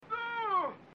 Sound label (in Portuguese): grito